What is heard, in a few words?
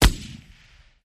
M60 Machine Gun Cal7